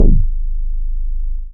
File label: BAS MINI, Mini-Moog; Sound & Vision - Gigapack II CD 1